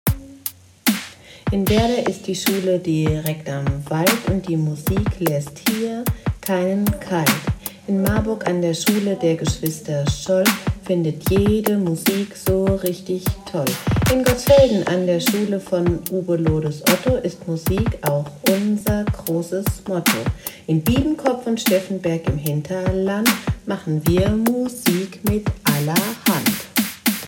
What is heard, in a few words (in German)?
Rap Teil